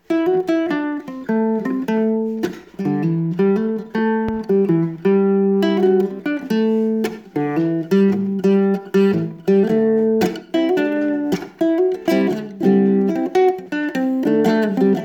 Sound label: guitarlele blues